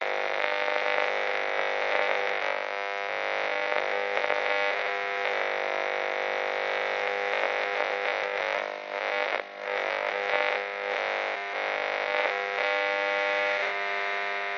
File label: radio static glitches loop; the sound pack tree